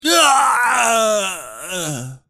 male death sound

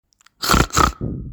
hoink

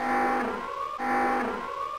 nuclear warning; terrafire

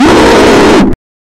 jumpscare; sonic exe

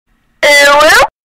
uwuuuu

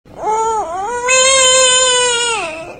frog cry

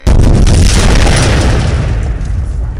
really big explosion

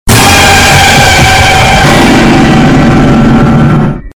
jumpscare loud

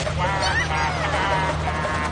laugh wah ha ha ha ha; wario

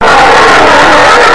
jumpscare sound; five nights at peach

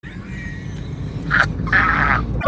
ahah

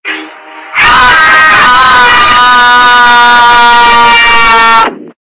goofy jumpscare

